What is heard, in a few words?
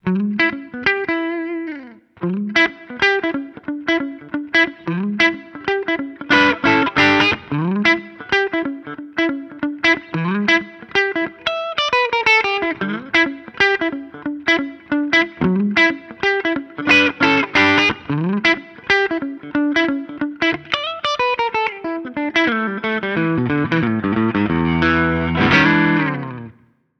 guitar; Cicada → Verb → Amp